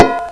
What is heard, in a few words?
hit metal